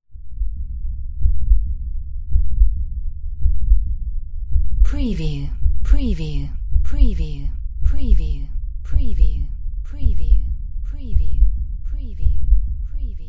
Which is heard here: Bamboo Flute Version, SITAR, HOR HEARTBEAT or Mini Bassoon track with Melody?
HOR HEARTBEAT